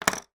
household comb nitty gritty head lice metal put down table; sound effects one